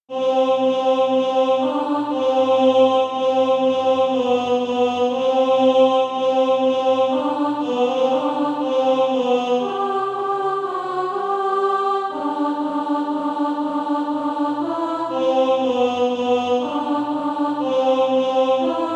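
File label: alto, Practice Music; A Cry as of pain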